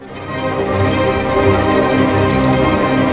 STR ARP MI, Symphony Orchestra, STR Arpegg. Minor; Roland LCDP08 Symphony Orchestra; STR Arpeggios

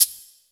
PERC -R, Drum & Bass, 160 BPM; Ueberschall; Drum & Bass Resonance

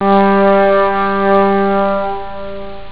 trump; Amiga Soundtracker Sample Packs

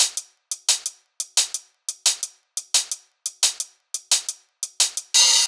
HatLoop; neuro; InsideInfo; Drums

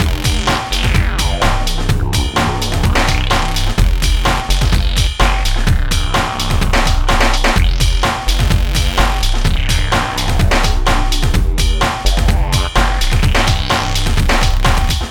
Scrubbed Clean 127 Fmin, hardcore breakbeat; Big Fish Audio

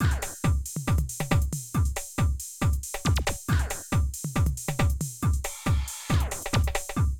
Drum loops, Euro techno

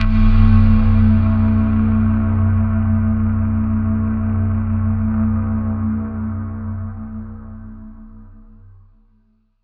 Tone, IDM

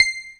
E.P. C6; Greytsounds; Scott Peers Sound Engineering

